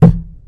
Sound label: Physics Wood Box Impact Soft; Counter-Strike: Global Offensive